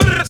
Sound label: Brr, Vox FX; Zero-G Jungle Warfare 1, 2 & 3